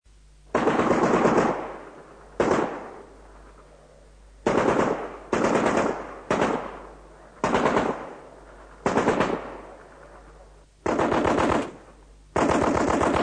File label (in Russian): zvuk pulemeta maksim